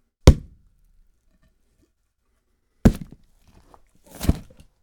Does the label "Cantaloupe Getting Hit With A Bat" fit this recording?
Yes